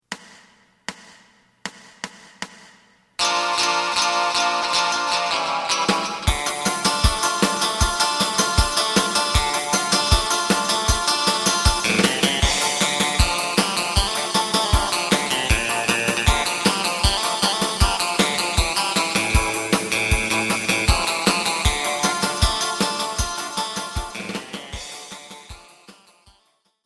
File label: bass